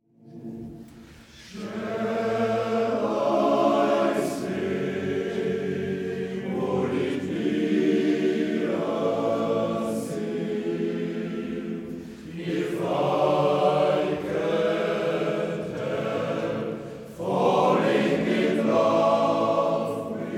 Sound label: coro